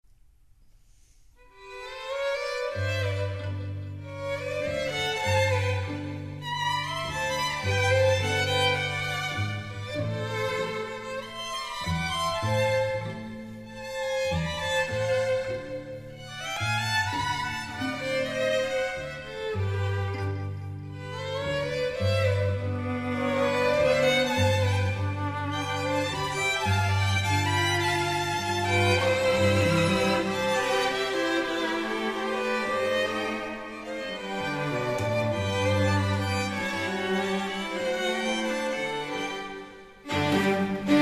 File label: tango